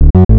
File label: mysterious